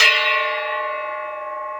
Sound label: Bell One-Shot, cymbal; KJ Sawka; Drum n Bass & Beyond; Drum Tool Box